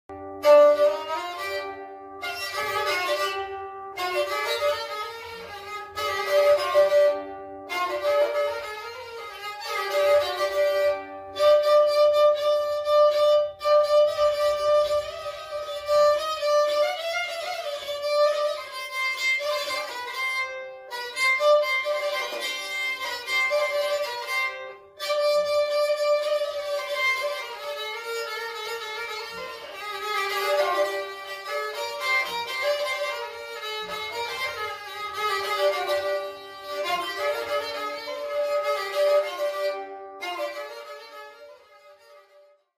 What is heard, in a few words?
Ghijak; تاجیکی